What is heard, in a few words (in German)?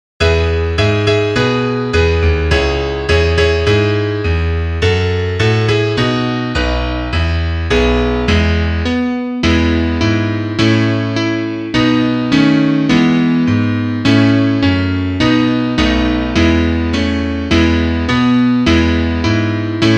Klavier Solo, trompete; Auf unsrer Wiese gehet was